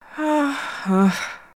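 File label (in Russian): женщина беспокоится вздох вздох тон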